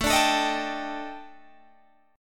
D sharp Major 7th Flat 5th A, chord

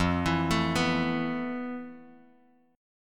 F Major Sharp 11th, Bass Standard; arpeggiated; JGuitar

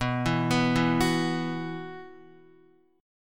B Suspended 4th, Guitar

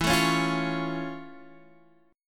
F Augmented Major 7th E

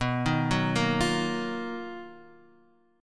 B Major Sharp 11th, Guitar, Half Step Down

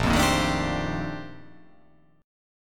A sharp Major 13th A sharp

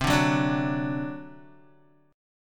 C Suspended 2nd Flat 5th C down Guitar; Csus2b5 strummed

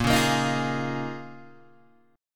Bb Minor 11th Bb down Guitar Open G

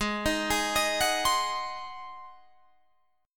G sharp 7th G sharp, Guitar Drop D

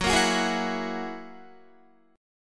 Gb 9th Gb down Guitar 4E,4A,5D,5G,5C,6F; Gb9 strummed

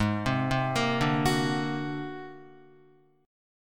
Ab 9th Flat 5th Ab down Guitar; Ab9b5; arpeggiated; JGuitar

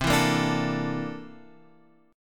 C 13th C down Guitar Open F; C13 strummed

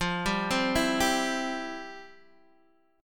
F Major 7th Suspended 2nd F, Guitar Open D

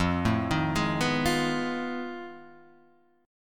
F 7th Sharp 9th Flat 5th, Guitar, Open D

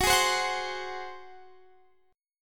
F sharp Suspended 2nd Flat 5th, Guitar, Standard; strummed; JGuitar

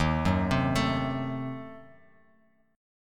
Eb Diminished 7th Bass Standard, Ebdim7 chord; Ebdim7 arpeggiated; 11,9,10,14; JGuitar

